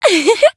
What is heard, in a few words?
Voice attack; rosetta